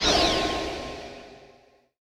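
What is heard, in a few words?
alarm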